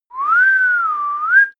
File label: whistle call; koerpergeraeusche; menschen; soundpool